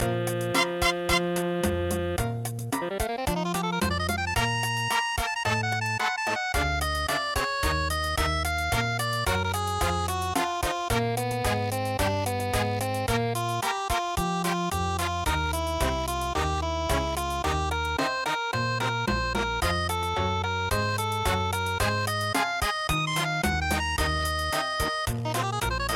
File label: cha Cha Cha Cha